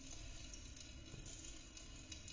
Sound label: Peeing loop wall